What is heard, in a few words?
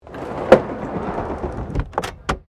HELICOPTER 1990 SIKORSKY UH60 BLACKHAWK SLIDING DOOR CLOSE; Helicopters; Vehicles; Aircraft Collection